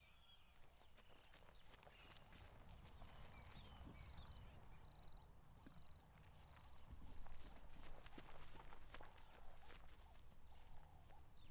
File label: Running On Grass Various